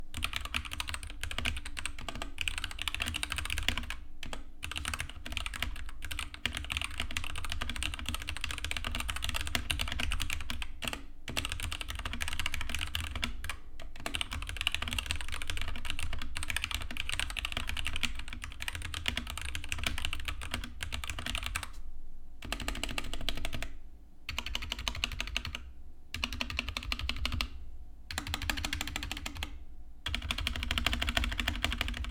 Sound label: ENDORFY Thock Compact soundtest